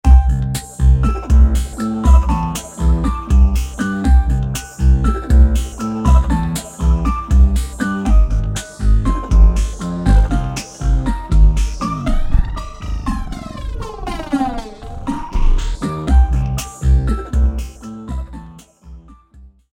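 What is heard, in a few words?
A folkloric-like melody is overlapped on a metallic beat. Used as SFX, the laughing voice’s formant is being changed, thus making it a characteristic sound.; V-Synth; ImaginaryKid (Phrase)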